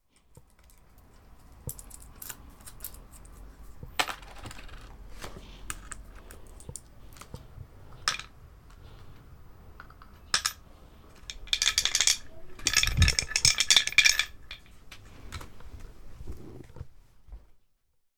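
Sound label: chokinbako ni ireru, putting into a piggy bank; Chino Cultural Complex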